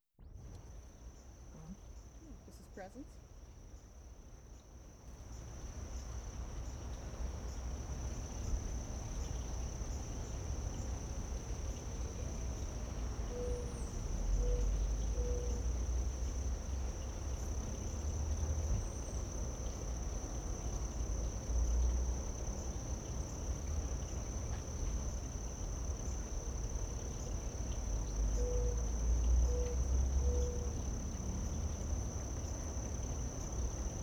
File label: Late Summer Crickets, Doves; Electa Quinney